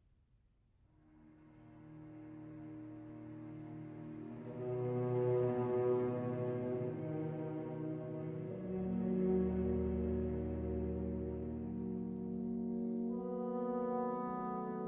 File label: Poème Pour Violon et Orchestre